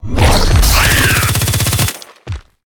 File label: Boss Mech Turtle, monster